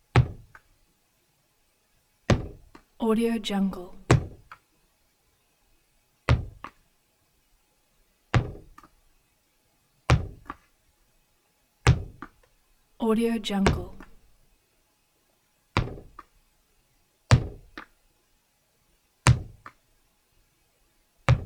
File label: sports tennis ball tennis bounces wood; human sounds